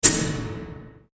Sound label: Resonant Strike; Bomb Sound Effects; explosion; impact; Andrew Fleming